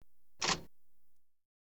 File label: Audience Applause